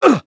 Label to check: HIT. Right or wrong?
Right